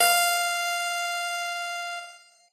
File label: piano; pianojs